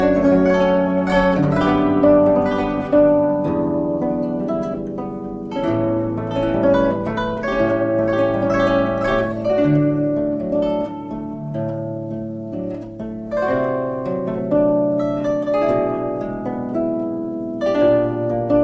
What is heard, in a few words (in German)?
Glocken von Campanile, zither